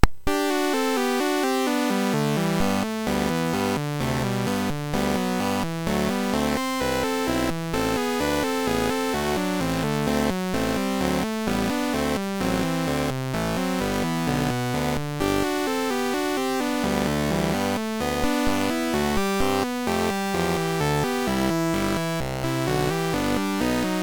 MOS6581R3, GAMES; Slinky

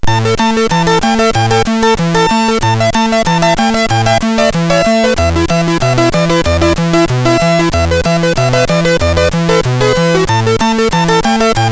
Hip Hop, sid MOS6581R3; Mermaid; Early Tunes

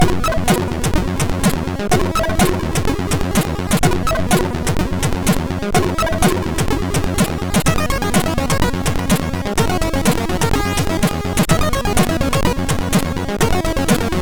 Music Test 3, MOS6581R2

